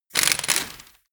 impact wrench screw fast single short

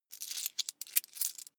coins clatter medium

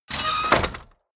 chest metal small close

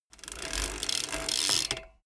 bmx bike pedal brake short

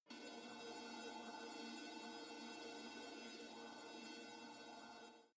space alien fabric machine energized far away

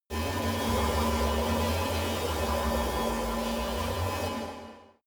space alien fabric busy

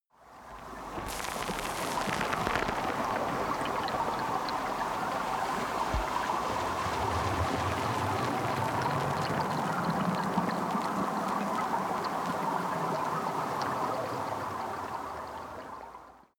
water fx crackling

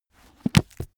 heavy book shut

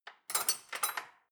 dishwasher cutlery grab

